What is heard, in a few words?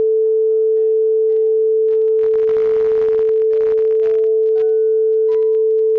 result signal; Unsupervised Learning; Random Projection and ICA